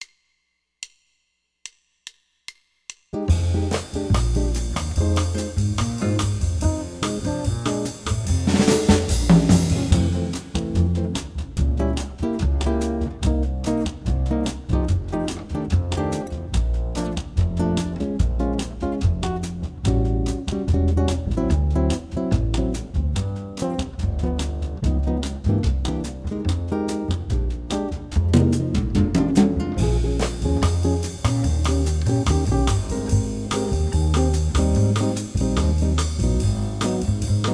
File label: bossa piano play along, jazz; LMEA All-State Jazz